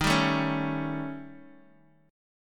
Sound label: D sharp 7th Flat 5th, Guitar, Open D